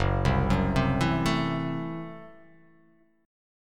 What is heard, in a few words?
Gb Minor 7th Flat 5th, guitar chord; arpeggiated; JGuitar